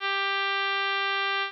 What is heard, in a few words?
trump g wave